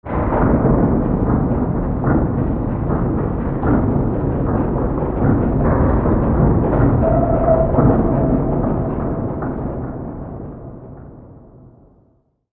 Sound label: muffled clang; cstrike